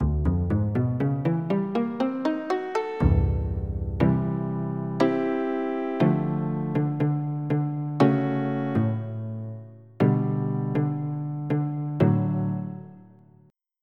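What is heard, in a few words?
Acoustic Bass; EverQuest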